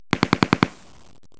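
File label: machine gun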